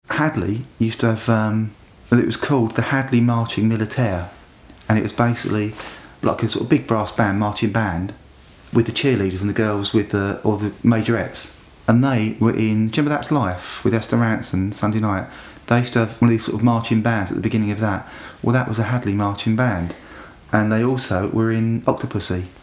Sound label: marching militaire; team hadleigh